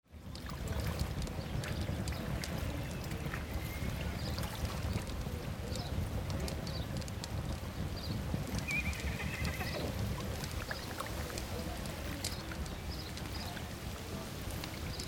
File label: Ambience; Microsoft Studios; Age of Empires III - Complete Collection; cinematics